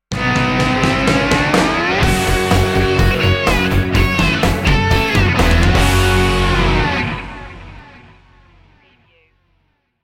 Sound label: hot wheels sting